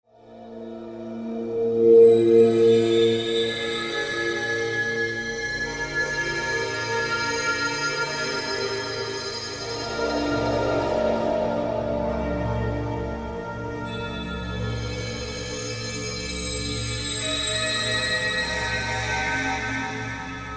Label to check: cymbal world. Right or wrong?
Right